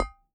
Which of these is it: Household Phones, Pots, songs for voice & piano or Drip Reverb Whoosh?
Pots